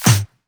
Claps; Vengeance; Essential Clubsounds Vol.4

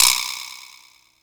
Vibraslap, Yamaha PSR E305

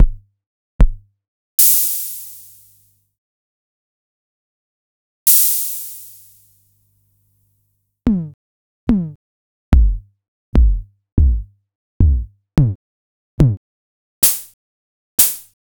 Synsonics DRM; Mattel Electronics Synsonics

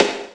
SD, Dance Mega Drums; Best Service; SD-HIP

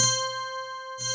C5, SynthLine

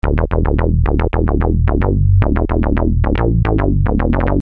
Syn Bass 110 Em, Techno Synth Loops; Sonic Foundry (Sony Creative Software); Signals I

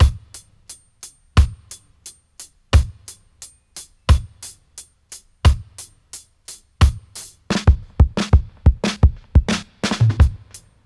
88 dry, drum loops, dub; Drumdrops In Dub VOL-1

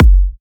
F#, Kicks; markus hakala

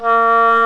WINDS & BRASS

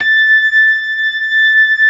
ORGAN, LOWER MANUAL

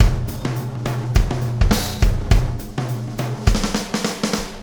Wormhole Beat, Processed Drumkits

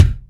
DM BRT AMBI, KICKS

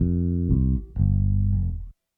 Call And Response Bass Ending, 70s Analog Funk; Sonic Foundry (Sony Creative Software); What it Is!

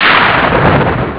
blast; ST-XX Amiga Soundtracker Sample Packs

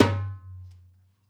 Dumbek; Essential Percussion

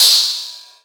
Rides, Cymbals

